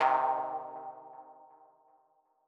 Mono DBeat C3; KG MegaSaur

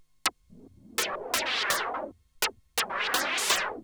Juno-60 Arp 125BPM

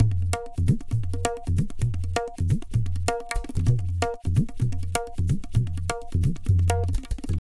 Tabla, 130 BPM; Zero-G; Pure Tabla

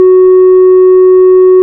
ORG, Farfisa; Roland LCDP09 Keys of the 60s and 70s 1; Farflt DBars

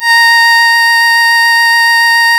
Synclav FM STRINGS A#; Big Fish Audio

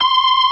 B3 C 6-4, LES

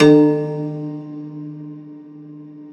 Rubbery Piano, prepared piano; Total Piano

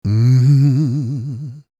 MMM, Vocal Blues Soul; Spectrasonics; Planet

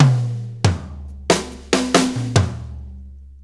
6-8 Fill, Drum Tools

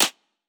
clap, hip-hop drum kit; 99Sounds x Monosounds; Moon

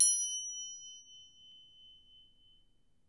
Percussion F#4, Harp; Miroslav Vitous - Percussion & Harp

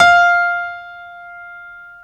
Stereo Grand, Keyboards

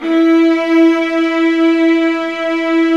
Violas Bright, String Sections; Roland LCDP13

